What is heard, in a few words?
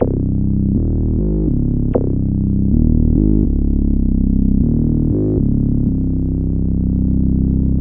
Synth Bass